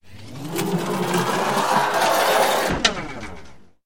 zvuki s effektom peremeshcheniia videokamery

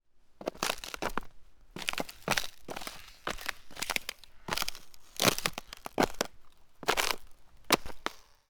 zvuki shagov po ldu

